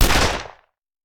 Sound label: Hand Gun; Sound Ideas; Guns